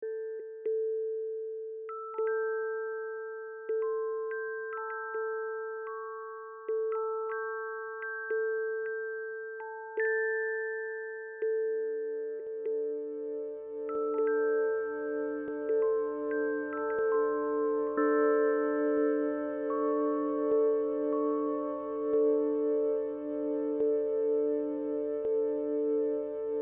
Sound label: musixbox high